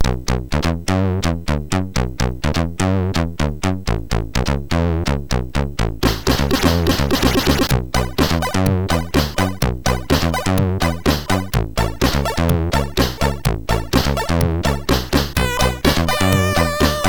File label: Swingy Dingy, MOS6581R3; Boogaloo